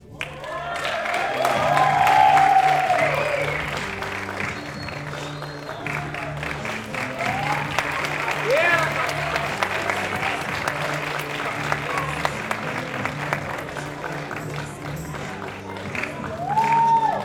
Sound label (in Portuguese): Pessoas ovacionando tres salvas de palmas seguidas com assovio e musica; multidao